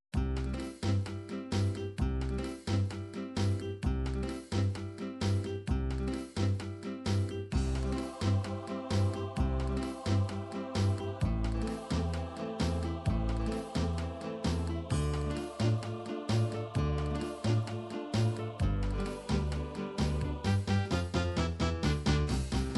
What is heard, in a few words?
pop